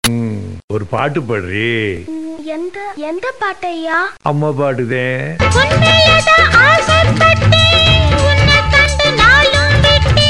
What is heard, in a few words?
Remix Song